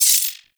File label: Glass Shotgun Shot Prime Layer B; Warframe; Tenno